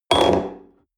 espresso upset table medium short

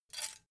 dough hook grab; industrie-wirtschaft; gastronomie

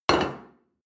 plate place table hard short; sport-freizeit; restaurant-cafe

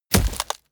body fall wood harsh crunch; krieg-waffen; nahkampf; soundpool

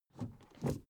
suv idle 1st gear change soft slow; verkehr transport; autos

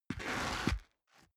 step boots concrete grit scuff; schritte; Footsteps

